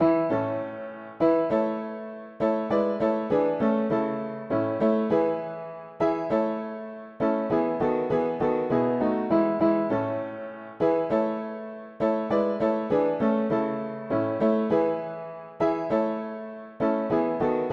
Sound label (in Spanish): mono piano; hymns; Himnario pequeno